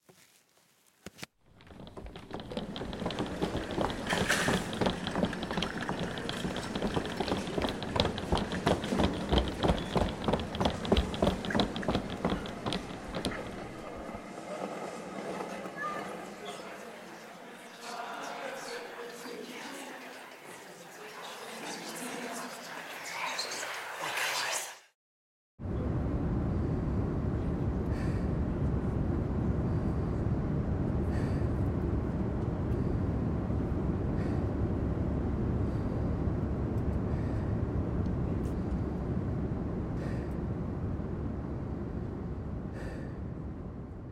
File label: memory soundscape